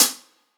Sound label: Hi Hat Acoustic; Drums; One Shots; Hi Hats; Ghosthack; Drum Mega Bundle; Drum Hero 5; Blackout